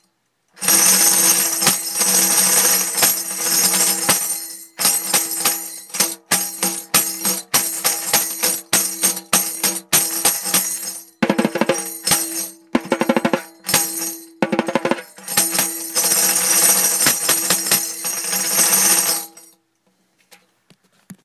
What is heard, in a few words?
bell banjo